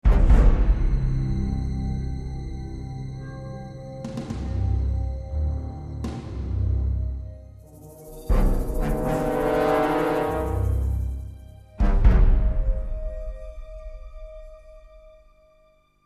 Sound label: Tension